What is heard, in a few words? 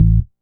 Thump Bass; Big Fish Audio; Old Skool House; OSH Bass